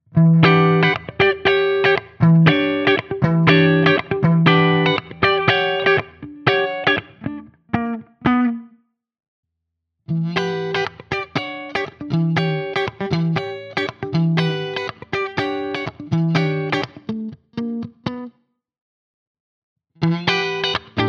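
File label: clean groove all positions, guitar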